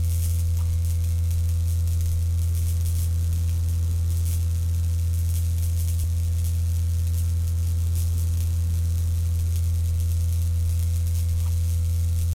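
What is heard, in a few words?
Sewer Light loop; silent hill homecoming 2008 gamerip pc ps3 x360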